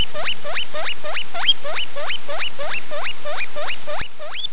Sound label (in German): schwein, meerschweine